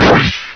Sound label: bullet; Dreamstars